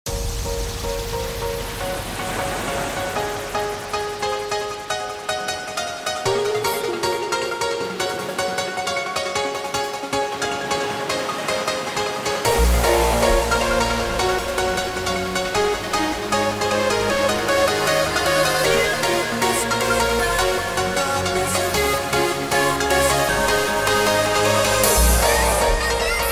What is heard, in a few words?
hardstyle